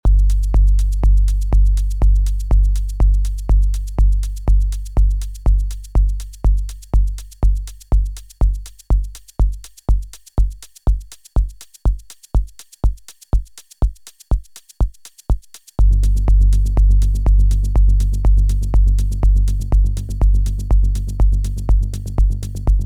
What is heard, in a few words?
Techno Kick Kick Decay